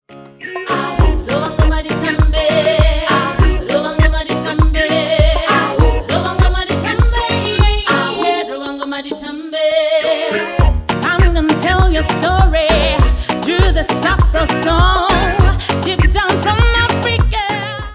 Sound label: Rova Ngoma